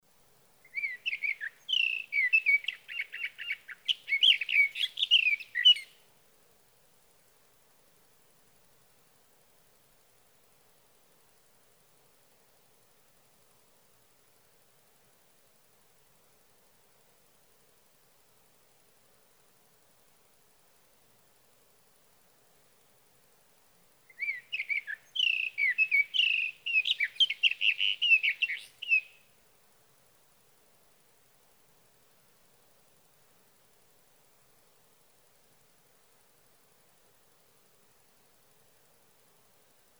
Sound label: Sage Thrasher